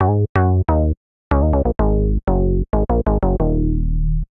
SynBass (Eb) 110bpm; Music Loops; Stub Nub SynBass; Ableton; Factory Packs; Singularities

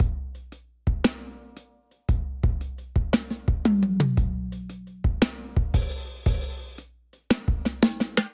Kit groove 115 bpm; MIDI Clips; Rockhaven Kit groove; Ableton; Factory Packs; Drum Essentials

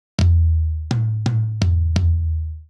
Straight Eighths Rock Toms Only 84 bpm; Ableton; Factory Packs; Drum Booth

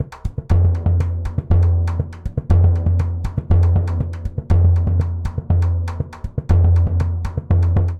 Samba 1 Surdo 120bpm; Latin Percussion; Ableton; Factory Packs

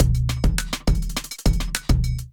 Kit groove 103 bpm, Drum; MIDI Clips; West Outer; Ableton; Drum Essentials

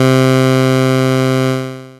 pwm, Retro Synths Waveframe Waveforms; Ableton; Factory Packs

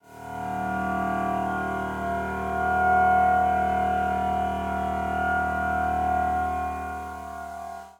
Texture, Drone; Laboratory; Silent Night Texture

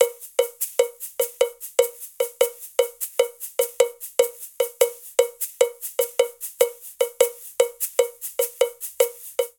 Colombia Shaker Cowbell 100bpm; Latin Percussion; Ableton; Factory Packs

